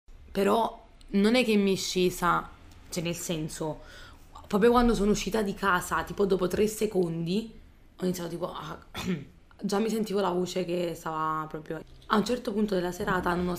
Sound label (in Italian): disfonia cry; voce